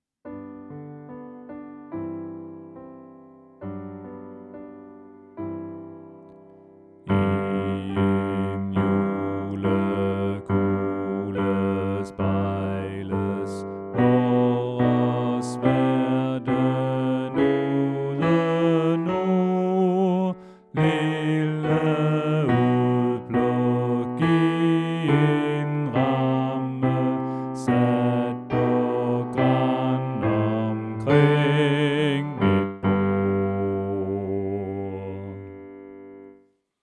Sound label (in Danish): Bas; oevefiler